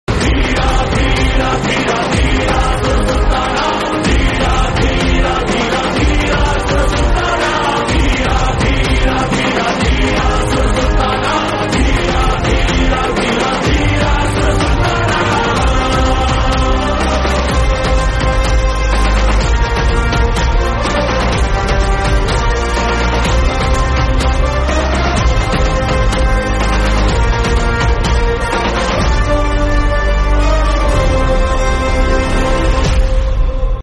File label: instrumental song; kgf